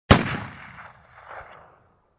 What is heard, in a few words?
Close pistol shot